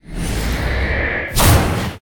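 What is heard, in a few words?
archimedeon spawn, monster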